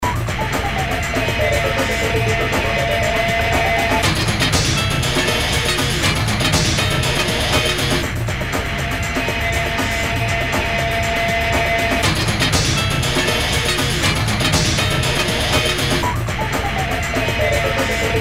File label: Wacky Groove